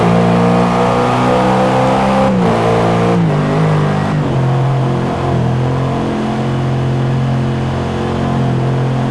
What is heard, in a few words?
fourth cruise, vehicles m3e92